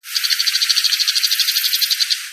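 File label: bull-headed shrike; モズ; 近くの神社の杜に住んでいるようです。; 百舌; 表現の仕様のない落ち着きのない声で、人をハッとさせます。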